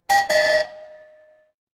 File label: door doorbell